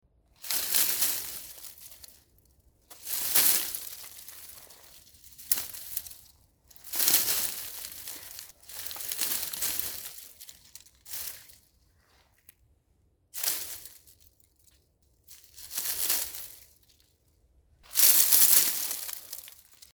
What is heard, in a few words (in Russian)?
audio effekt vorosheniye vetok, prirodnyye zvuki zimy; prirodnyye zvuki zimy zimniye audioeffekty